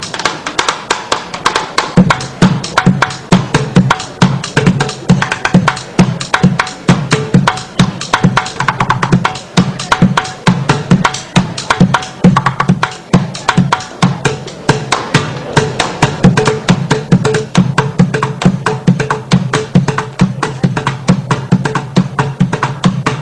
street drum

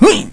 pain

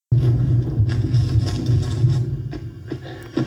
Alien amb; Resurgence

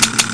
dry fire, weapons

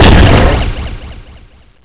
fist; vendetta; robocop

